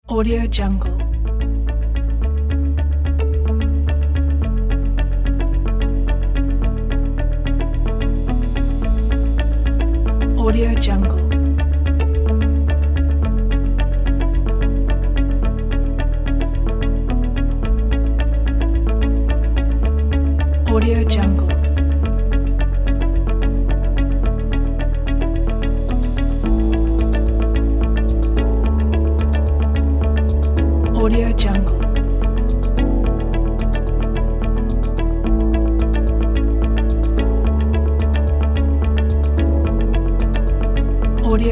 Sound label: motivational technical kit